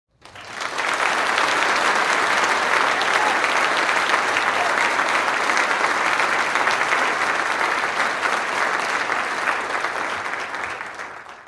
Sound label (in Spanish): aplausos suaves